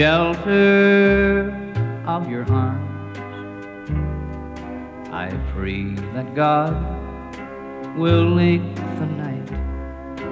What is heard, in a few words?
country